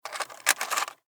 Technology DV Camera Insert Tape Panasonic DVX 1080 Camcorder